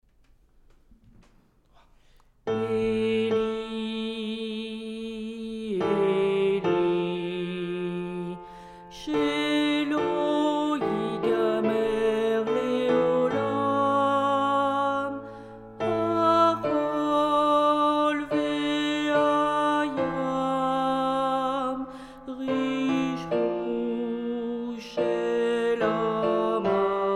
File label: eli eli altos